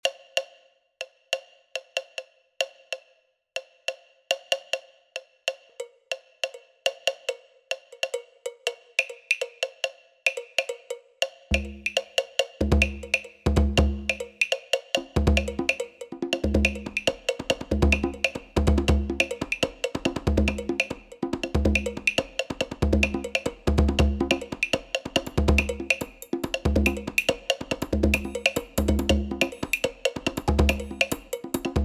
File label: polyrhythm